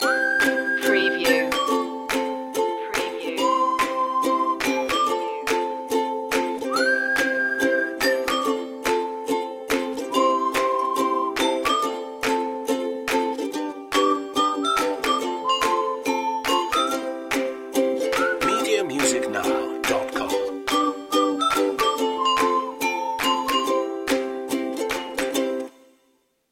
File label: jolly ukulele loop; positive music